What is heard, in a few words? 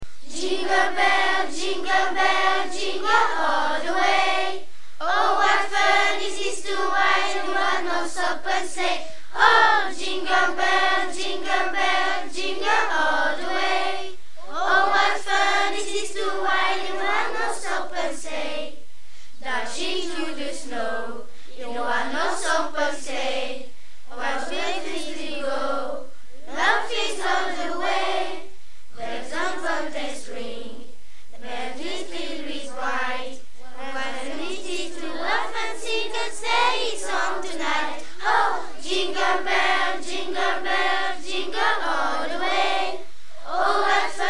jingle bells